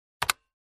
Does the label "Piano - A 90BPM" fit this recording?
No